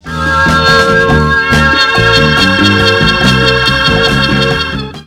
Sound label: accel leslie, hammond